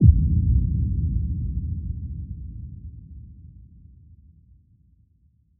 impact

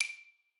xylo

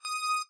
lead

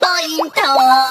point; 东方

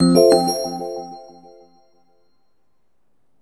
SE INFO ATTENTION; maimai

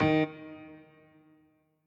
piano riff intro

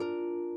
harp

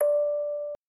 orgel

